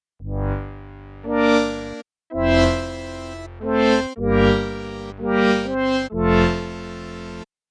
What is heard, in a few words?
Horns